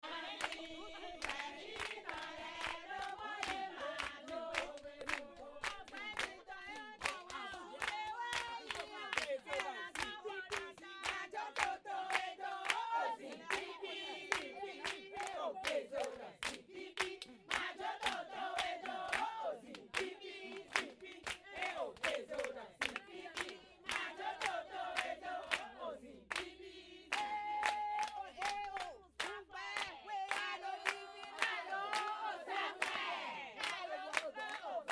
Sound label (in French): chant de bienvenue